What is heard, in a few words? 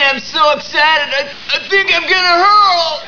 hurl